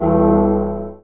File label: bell; Undercroft